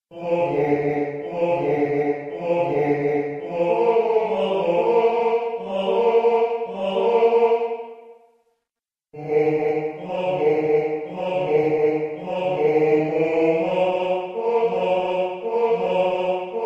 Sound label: tenor